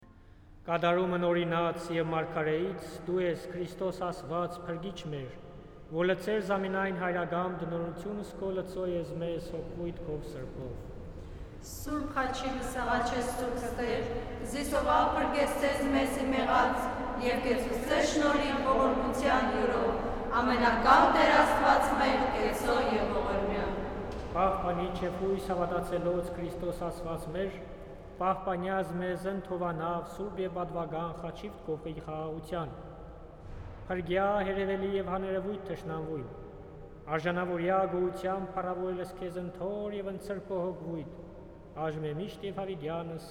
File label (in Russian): Армянская месса; Ты – исполнение Закона и Пророков Благословлю Господа